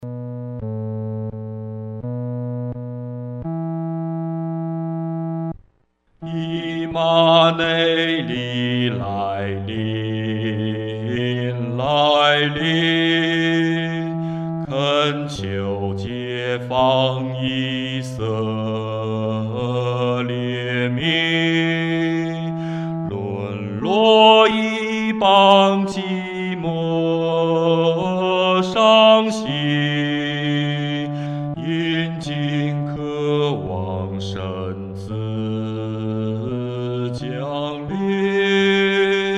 独唱 第四声; 以馬内利来临（一）

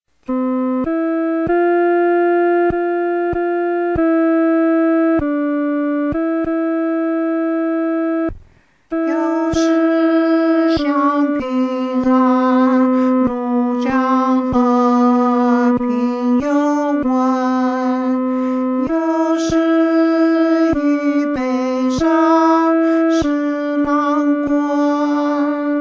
独唱 第二声

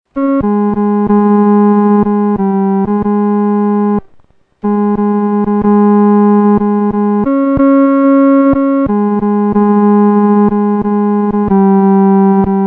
独奏 第三声

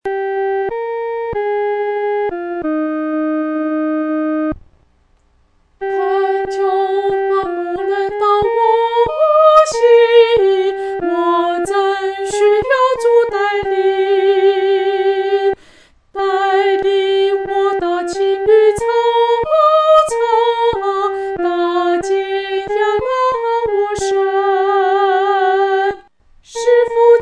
独唱 第一声

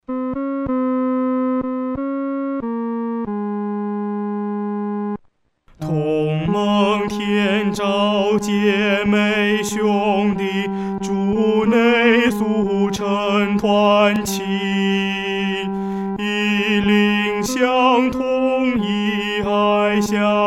独唱 第三声

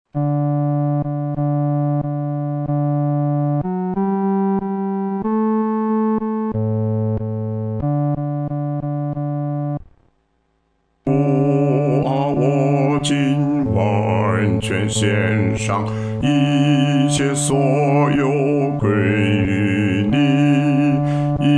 独唱 第四声

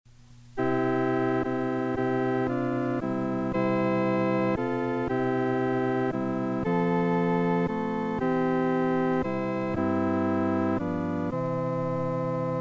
合唱（四声部）; 主若今日接我灵魂

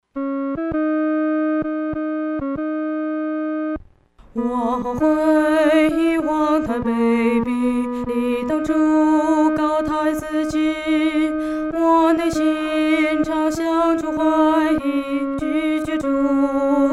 独唱 第二声; 何等痛悔

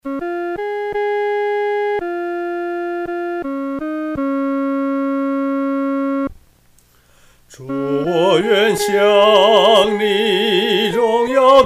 独唱 第一声; 主，我愿像你

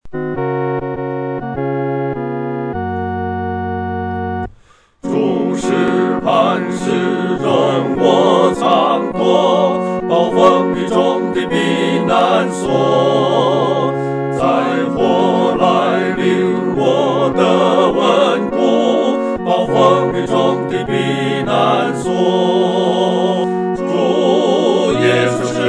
合唱 四声部

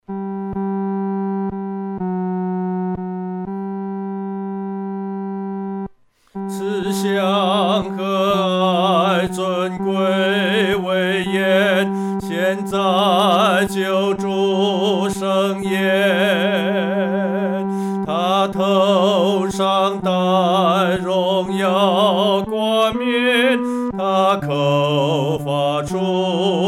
独唱 第三声

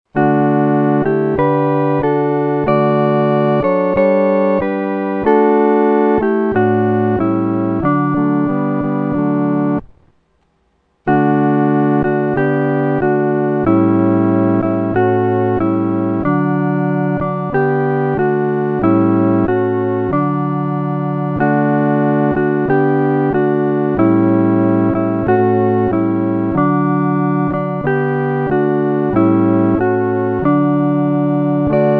合奏 四声部

